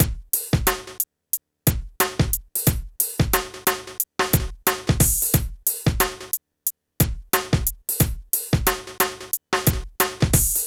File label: PHATT BEA; E-MU Formula 4000 Series Vol. 1; Hip Hop Nation; PhattBeats88-90